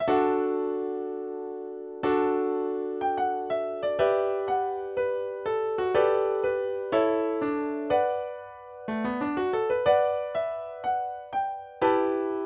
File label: guitar solo